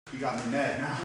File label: hurt light; billy